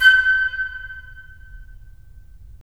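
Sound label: FL, flute; LA Composer vol. 3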